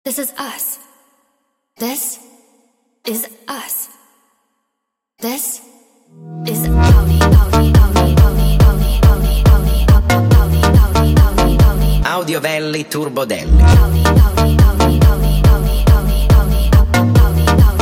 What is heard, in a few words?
Funk sped Up